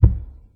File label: tight lows bass drum C key, kick sound tuned to the C note. Loudest frequency: 97Hz